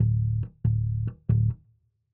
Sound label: bass note D; Calypso